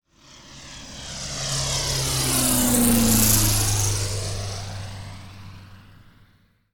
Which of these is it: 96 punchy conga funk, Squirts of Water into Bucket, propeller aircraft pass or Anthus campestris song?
propeller aircraft pass